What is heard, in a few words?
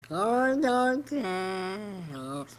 piano